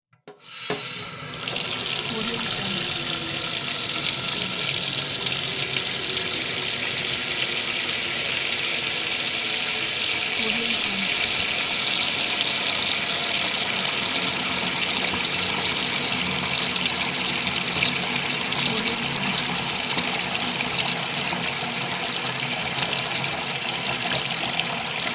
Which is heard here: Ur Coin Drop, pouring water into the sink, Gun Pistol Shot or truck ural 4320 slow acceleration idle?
pouring water into the sink